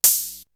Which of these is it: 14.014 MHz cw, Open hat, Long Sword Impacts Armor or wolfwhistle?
Open hat